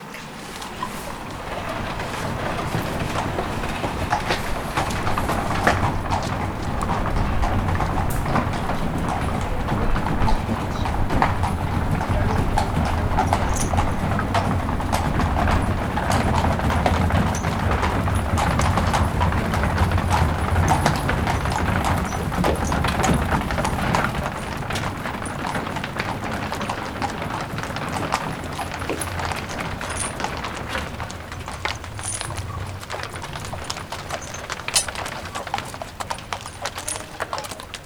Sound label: Time machine SFX; Horsestall